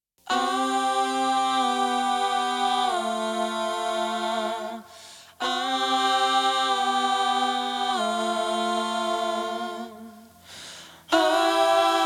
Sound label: (backing vocals excerpt)